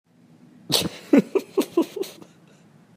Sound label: baby laugh; I love this laugh.